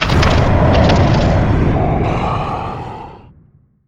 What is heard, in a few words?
death; Barotrauma; Barotraumatic; Carrier